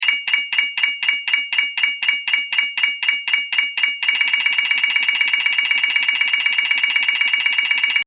Timer Loop, alarm; electronic; Loopable

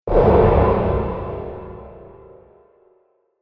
Fantasy Explosive Impact; Miscellaneous Impact; Andrew Fleming

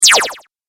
8 Bit Style Laser Zap; Video Game Sound Effects

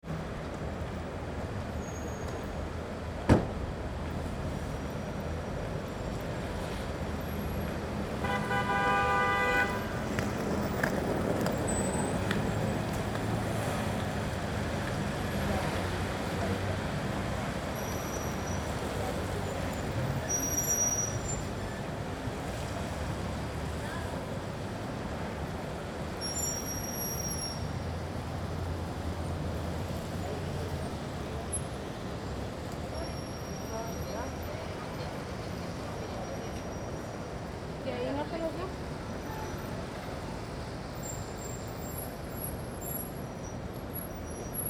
city lite traffic rain, City, Light Traffic, Rain; Light Traffic In Big City On A Rainy Day . Pedestrians And A Skateboarder Go By .; Cities

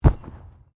Body movement Fall Heavy

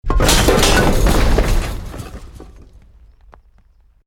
BUILDING COLLAPSE SCAFFOLDING SMALL; Created dramatic effects of buildings collapsing or breaking

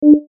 Deep Beep; Foley Fx; Beep Sound Effects; electronic sound effect; Andrew Fleming

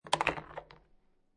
Billiard Pool Snooker Ball Falls Into Pocket; Ball falls into pocket where there is already another ball

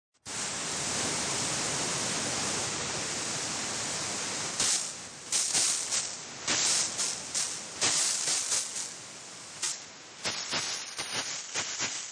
Grdn Hse, Spraying a garden hose; Yard Tool Sound Effects

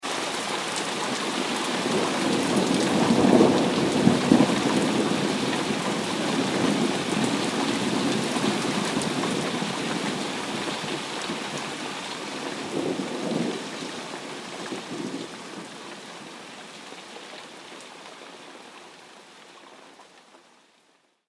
Heavy Rain Thunder Ambience

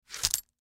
Combat Knife Out Of Holster; Warfare; Knives (Sound Effects:Combat); Blastwave

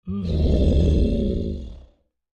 Creature Large Growl Aggressive; Monsters; Horror; BigRoomSound

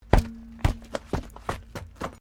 Wreck Wheel Alloy Bounce; Wrecked Cars, impacts, crashes, scrapes; Objects

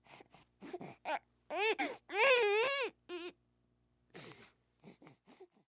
Human Baby Fuss Cry; Babies (Sound Effects:Voice)

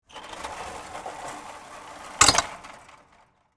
Gate Close Coral, Fence; Gates (Sound Effects:Doors)

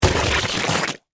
Impact Suction Mud, Texture, Large; Objects; BlastWave

